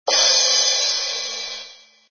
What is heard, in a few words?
crash; Habbo; Cokemusic; Mixer Library; Rock Sounds